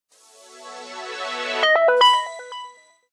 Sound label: Start up, phonetones; GM630